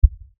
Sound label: LFE Hit, Lfe Hit Low Bass Impact; Blastwave; Sound Effects:Sound Design